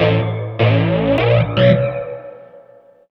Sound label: philter (phunk); misc; Sonic Foundry (Sony Creative Software); Ugly Remnants VOL-2